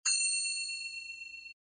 cymbal finger

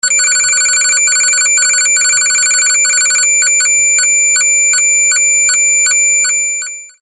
Electronic Comunication Digital

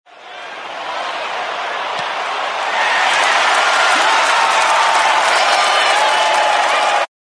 soccer penalty kick; sports

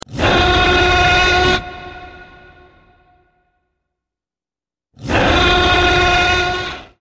irritated rajasaurus

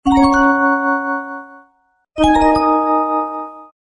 fantasy bell gliss

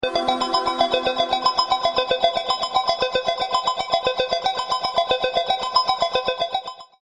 Starlight Tone

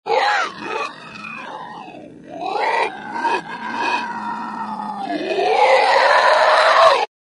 monster snarl; scary

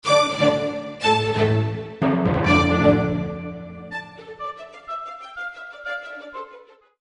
Ode To Joy Music Alert

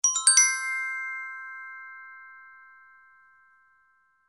I Pad Bell Alert

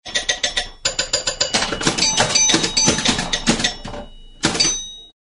bell pinball vintage long play

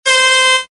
comedy hard toot

